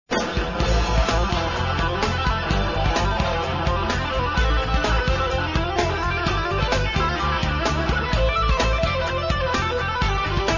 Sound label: guitar